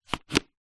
household air conditioner plastic remote slide into wall mounted holder; zapsplat